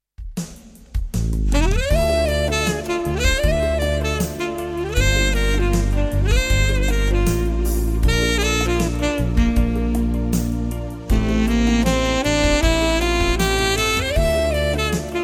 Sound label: instrumental cover